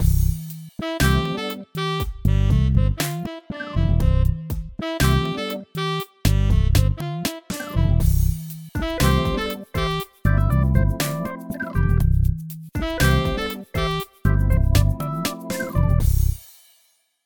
Happy Jazz, ringtones Fun; Samsung Galaxy S25 Ultra

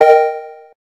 electric, keytone

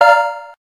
electric star, keytone; SKY IM-A600S-Sirius

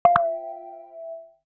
battery low, Nokia

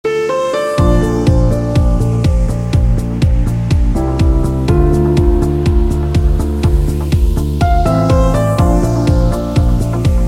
Inspirate, ringtones; LEAGOO; M9

